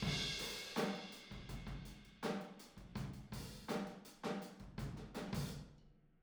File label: Boogaloo - Outro - Room ST, Drums, 82 BPM